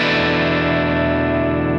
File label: Em7(11)